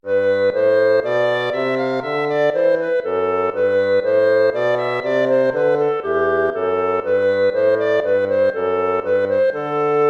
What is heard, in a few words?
baroque minuet